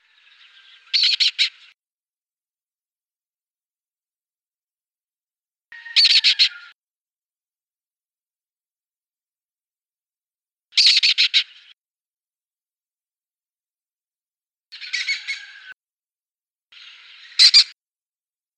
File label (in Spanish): cernicalo primilla